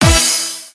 bonus chance; Diamond Cats

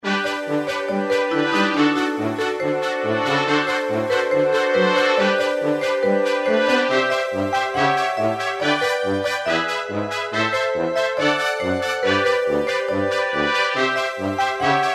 circus reelspin bg; games; Crazy Circus

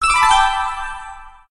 win increase win level

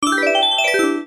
win; Hot Fruits 100

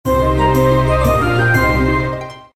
win medium; Jesters Crown

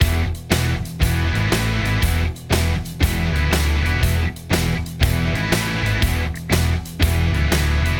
winning ambient; Wazdan

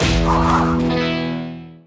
symbol badge; Wild Guns

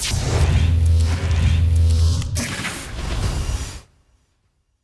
sphinx symbol fx; Golden Sphinx; Wazdan

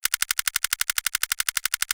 count loop, big win

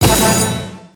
stop bonus; Flying Dutchman

